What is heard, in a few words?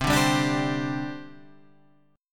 C Suspended 2nd, C, guitar chord; Csus2 Chord {8 5 5 7 8 8} strummed